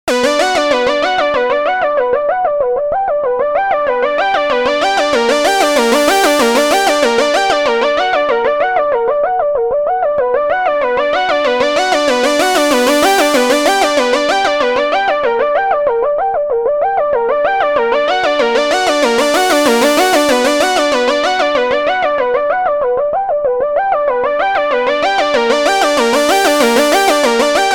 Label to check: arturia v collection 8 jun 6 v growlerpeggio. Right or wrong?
Right